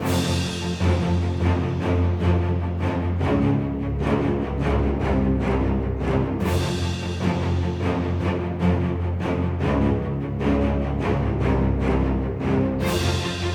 Action, Fantasy RPG Tracks; Free 25 Fantasy RPG Tracks Vol. 1

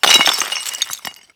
Shatter, Stone; NV3D

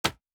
Wood Chop; Survival Game Sounds - Lite Edition; Crafting

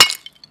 Pick Hit, Shatter Stone; NV3D

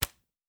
Arrow Shot; Survival Game Sounds - Lite Edition; Combat and Hunting

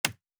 Wood Chop, Crafting; Survival Game Sounds - Lite Edition

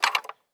Metallic Gear

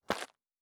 Dirt Walk Surface, Footsteps

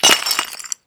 Gem Shatter; NV3D; Shatter Stone

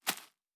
Leaf Run Surface, Footsteps

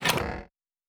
Door Open; Survival Game Sounds - Lite Edition; Doors

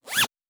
Zipper; Survival Game Sounds - Lite Edition; Objects and Items

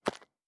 Dirt Walk Surface, Footsteps; Survival Game Sounds - Lite Edition

